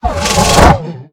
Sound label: UV Pain; The Inn Zara; Devour 2021 gamerip pc macos